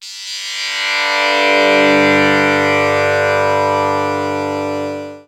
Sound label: pad G; evo 1; Sonic Foundry (Sony Creative Software); Ugly Remnants VOL-2